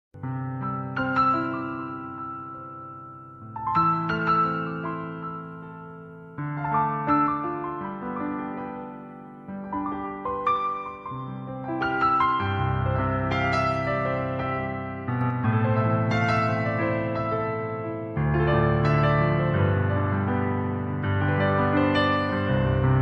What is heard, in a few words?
instrumental ringtone